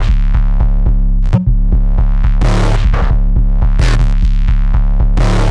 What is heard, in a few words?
174 Bass C-m Full